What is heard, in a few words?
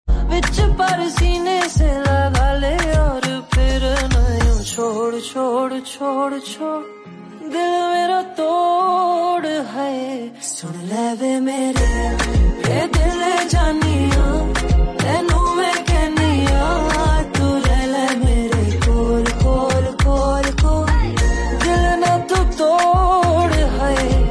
Ringtone, Punjabi song